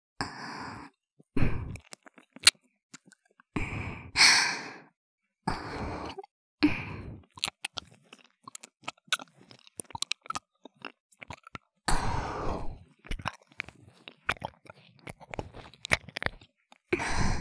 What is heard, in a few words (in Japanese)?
エッチしよ 耳舐め